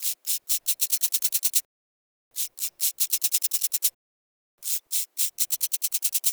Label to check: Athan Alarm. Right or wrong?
Wrong